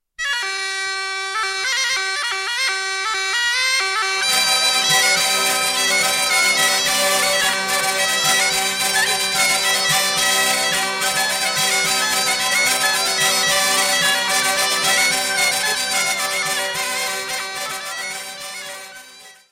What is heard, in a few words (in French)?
Bourree Croisee - France - Auvergne